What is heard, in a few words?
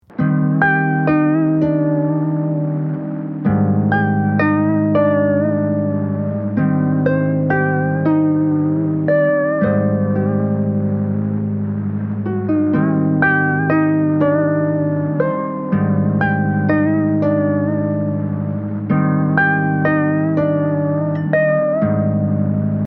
Harp hi noise hi wob